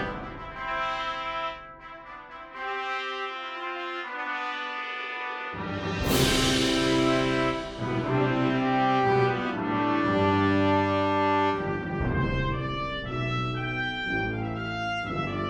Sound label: Fanfare